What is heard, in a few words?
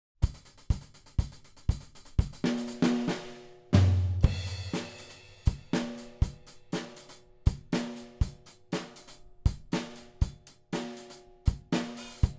Drums